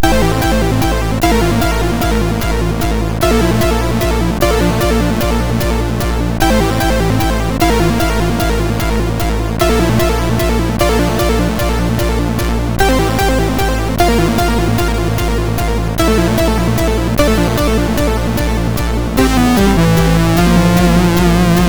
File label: Atmos, MOS6581R2